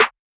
Snare, trap drum kit; MZ BEATS - FREE TRAP DRUM KIT

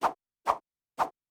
fast whoosh

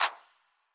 Clap, trap drum kit; MZ BEATS - FREE TRAP DRUM KIT

